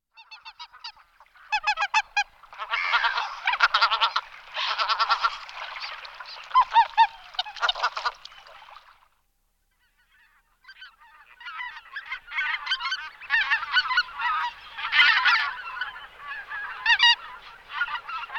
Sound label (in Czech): Husa belocela Anser albifrons